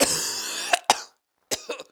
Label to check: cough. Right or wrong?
Right